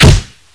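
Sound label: grenade launcher, weapons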